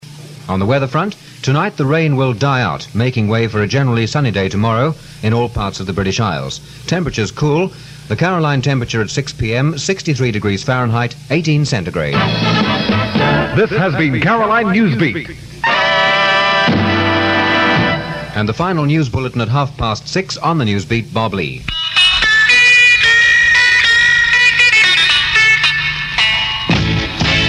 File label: horn; 1966 july; caroline south